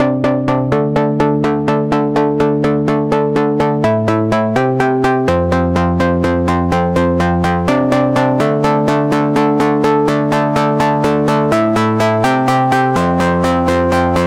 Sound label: Distorted Two Saw Pluck